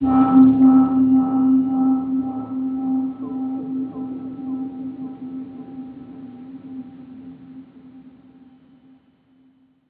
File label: SYNTH Defunct System (C), One Shots; Cymatics; Mystery Pack Vol 4